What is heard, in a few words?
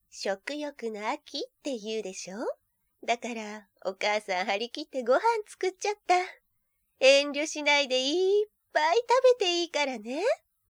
haha